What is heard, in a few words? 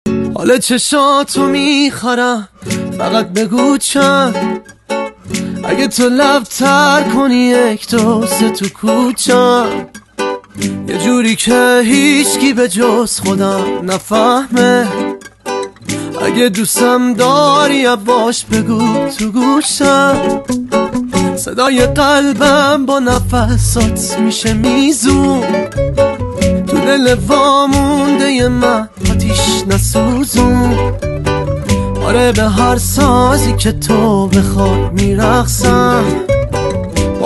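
happy music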